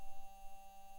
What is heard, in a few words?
mic, mars2020 edlcam